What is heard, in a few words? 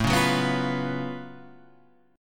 Gb Minor Major 11th A